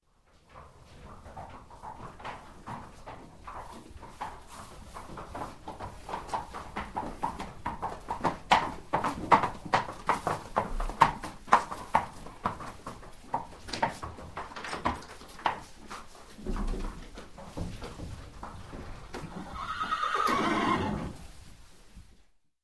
Horse Walk In Stable; Sound Ideas; Series 2000

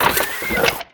Gun Reload Scifi, Weapons Foley; SFX Kit

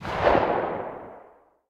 gunshot echo; Blind Drive

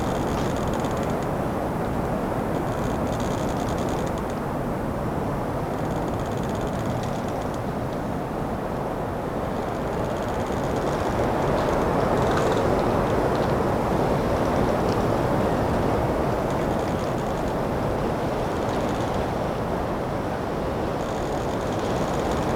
Wind trees; Inquisitor 2

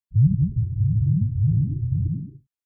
Bubbles Underwater Slow Deep - Submergence; Hollywood Edge; sound designer's toolkit v2

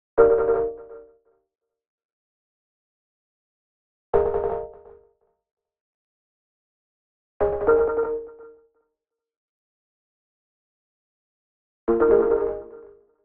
Arcade Jingle Neutral; boom library; The Interface

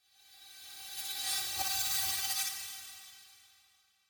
Granular Feedback Tone Thin; Hollywood Edge; sound designer's toolkit v2

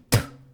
spit; Apotheorasis

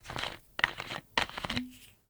FOLEY Unscrew Bottle Cap; mono; Universal Sound FX

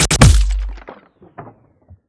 Laser Scope; Plastic Surgery

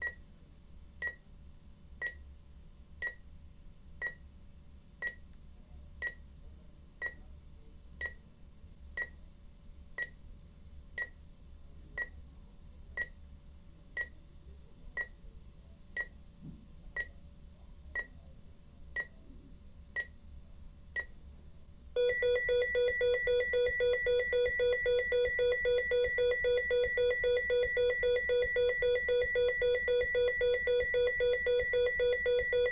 Medical EKG Heart Monitor; Home & Office; 1001 Sound Effects

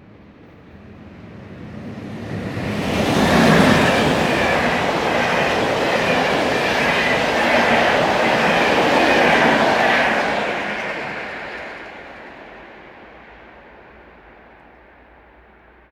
train fast passing; best service Blue Box

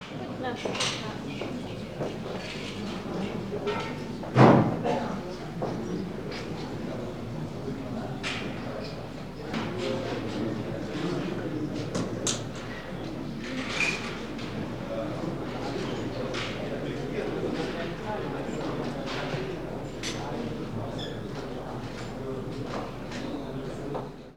restaurant; best service Blue Box

